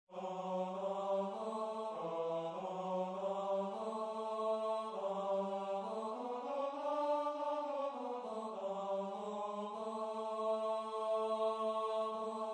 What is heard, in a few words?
Tenor; Dejlig er den himmelblå; korsats